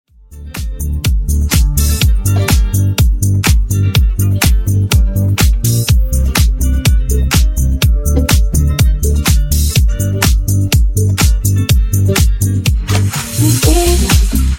Dizko Funk Remix 124 bpm

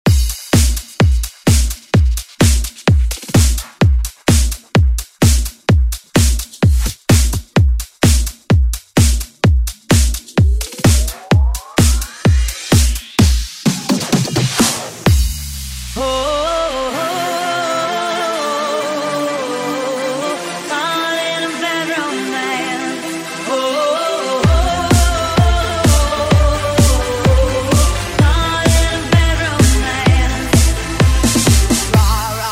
Hit Pop Redrum; Deejay Hit

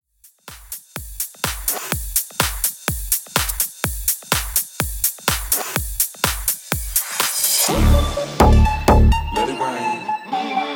Bootlegz House 125bpm

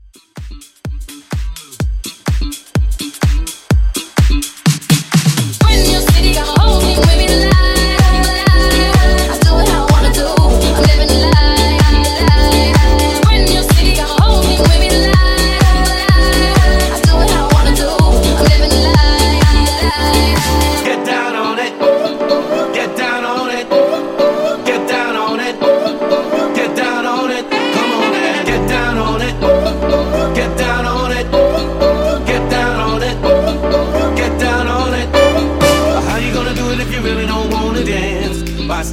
House Intro Reboot, 126

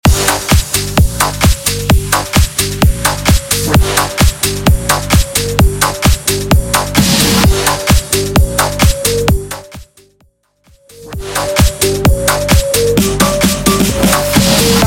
Bounce Mash Up 130 Bpm; Bounce Edit; Mainstream VIP; Vegas Edit

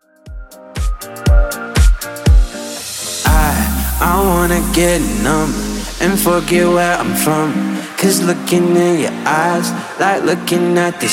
Top40 DJ Friendly Redrum 120 bpm

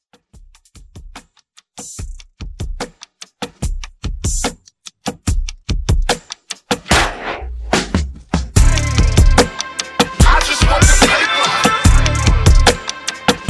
Intro Beat

